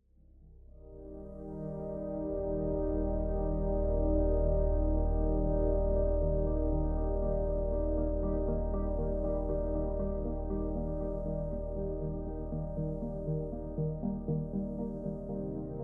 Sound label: Explore Ruins Electro